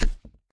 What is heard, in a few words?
spin Button Down; jumanji